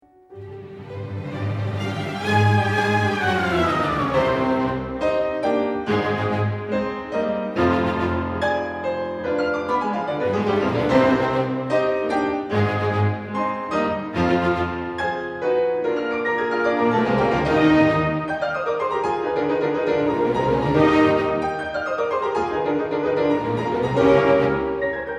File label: allegro agitato